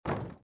SFX build wood; Out of Reach: Treasure Royale

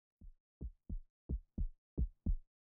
Heartbeat Loop; gamerip 1998; Unreal; Vortex Rikers

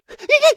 lava vocal; Scrat

